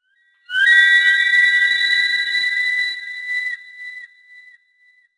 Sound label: eagle; World of Tanks Blitz